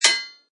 Impact Metal; Metal object such as sword or knife impacts with metal or anvil; Swords; Combat

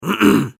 Human Male Cough Clear Throat; Sick (Sound Effects:Human)

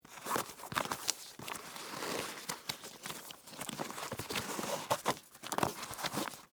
Boot Untie Laces; Foley; Dressing Sound Effects; Human; Blast Wave

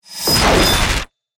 Whoosh To Hit; Whoosh, Swirl, Suction, Production Element, Imaging Element, Accent, Transition; BlastWave

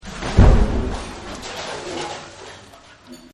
Deep Impact; Combat; Partners In Rhyme

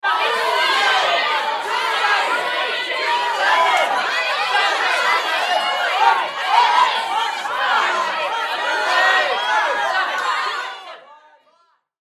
Human Crowd Studio; Crowd Reactions Game Show Scream Numbers Long Multiple

